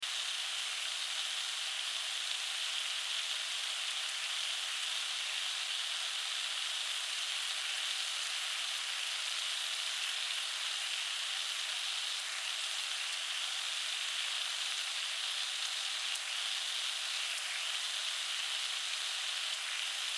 Stove Cook Sizzle Loop Close Perspective; Household Stove Cook Sizzle Loop Close Perspective Appliance; Blastwave

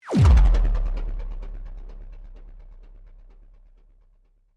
Sweep Mechanical Medium

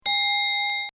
Clock Mantle, Half Hour; Clocks (Sound Effects:Machines)

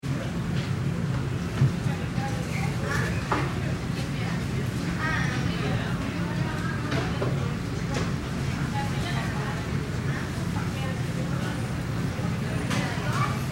Crowd Restaurant Costa Rica Active; Fast Food Restaurant; Backgrounds; Big Room Sound

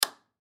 Ship Cabin Light Switch Flip Off; Transportation Watercraft; Boat Sound Effects; Blastwave

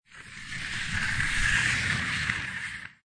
Skiing Snowboarding Downhill Slide By Slower

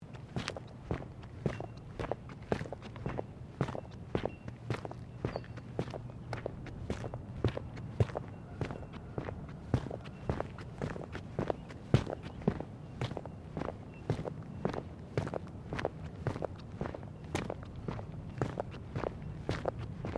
Footsteps Boots Plastic Ground Mat Sequence; Footstep Foley; Human

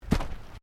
Footsteps Boots Walking Track Sandy Step; Footstep Foley; Human

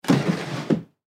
Ship Bed Wood Drawer Under Bed Open; Transportation Watercraft

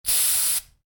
Spray Can: Spraying; Objects; Stuart Duffield

